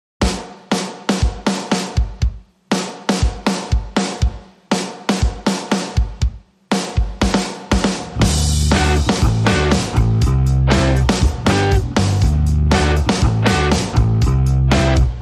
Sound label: No Lead Guitar